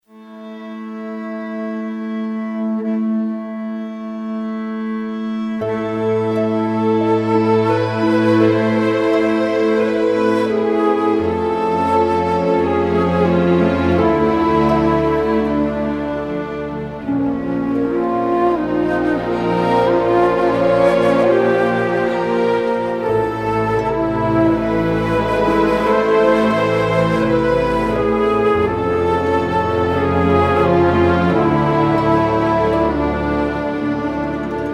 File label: Siren Song; Tiragarde Sound